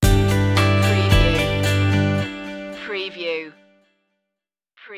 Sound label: sting